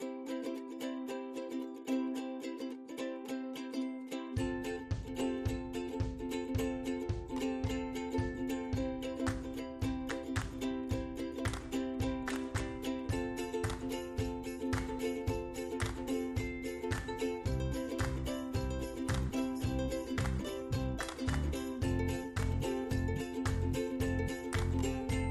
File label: music cheerful; bgm